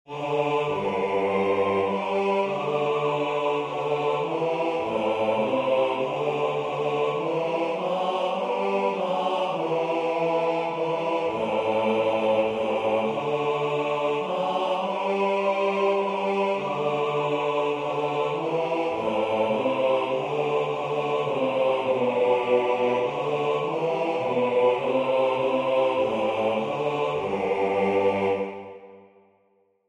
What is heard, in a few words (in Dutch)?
De dag door uwe gunst ontvangen B; scholefield